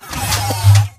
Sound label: Mvmt Servo Light Medium; AI Stalker; Titanfall 2